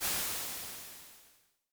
Crash Waves, Vermona Mono15 Drums

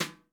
brush; Northstar - New Gold VOL-2; 30S BRUSH

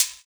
Shaker; Equipped Music; Loungin House

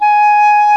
DOUBLE REEDS; OMI - Universe of Sounds

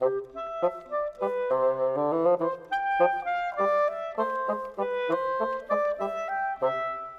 Winds, Orchestral; Sonic Foundry (Sony Creative Software); Orchestral VOL-2

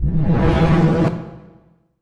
cinematic impact wipe

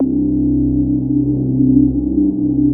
C3, BASS; Q Up Arts; Dream Experience

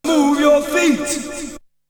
Vocal; ZERO G - Datafile DISC 2

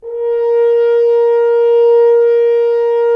Solo F.Horn; Roland - LA Composer vol. 4

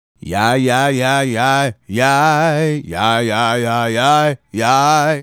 Male Solo, Vocal

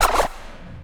tech fx; Best Service; Hyper Dance; EFFECTS

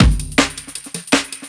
FRACTURE BREAK

